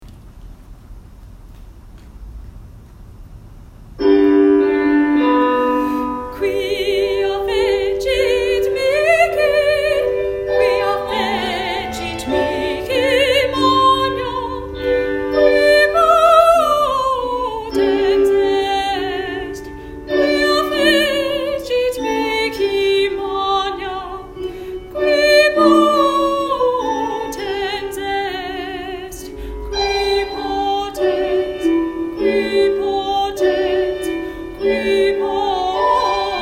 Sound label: VOCAL MODEL; Quia Fecit